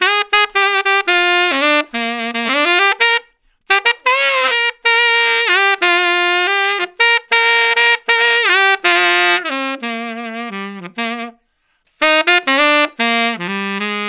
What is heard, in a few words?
Tenor Solo, Jazz, Traditional, Tenor Sax